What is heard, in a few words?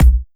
Minimal Bassdrum; Mutekki Media; Vengeance Electroshock Vol.2